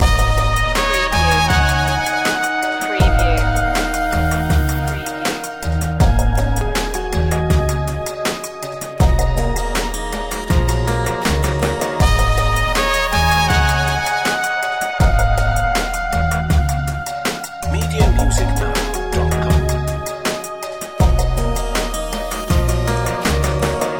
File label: take me over loop